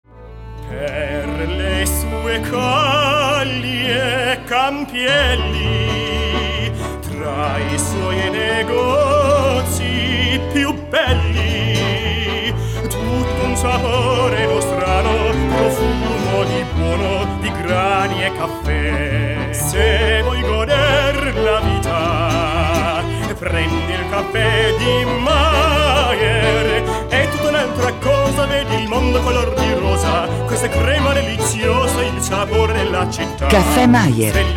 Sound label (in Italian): spot audio caffe jingle; caffe majer